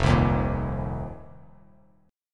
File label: F 7th Suspended 4th F down; strummed; JGuitar